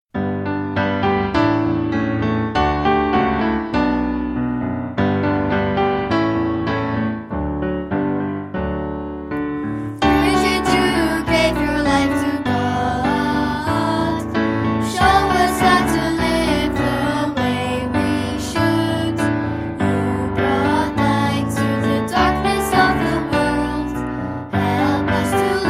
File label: choir